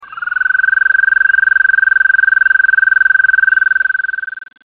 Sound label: Bufo americanus; voice